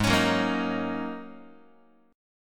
G sharp 7th Suspended 2nd Suspended 4th G sharp Guitar; G#7sus2sus4 strummed; x,4,4,4,2,2; JGuitar